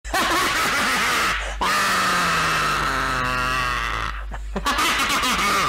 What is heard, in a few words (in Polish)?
usmiech